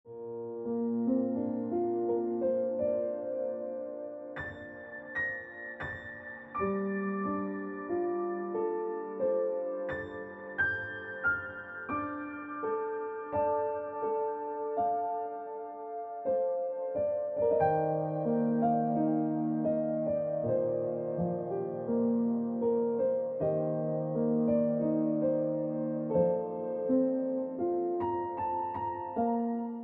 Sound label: Ringtone Piano Music